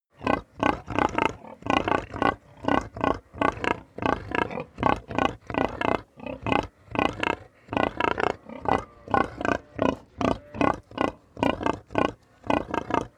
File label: pig grunt crowd busy